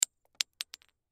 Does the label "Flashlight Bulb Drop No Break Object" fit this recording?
Yes